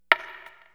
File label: rim